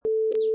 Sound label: Cheep